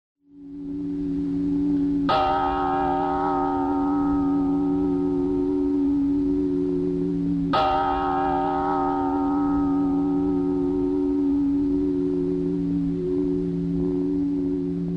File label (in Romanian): clopot